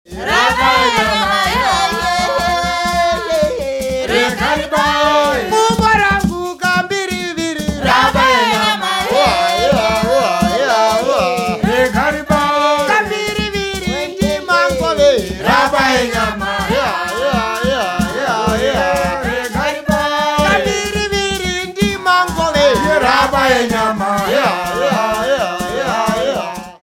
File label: mbira